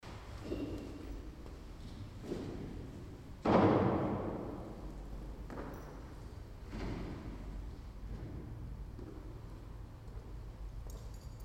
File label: Glast Abbey Kitchen; inside glastonbury abbey kitchen; field recordings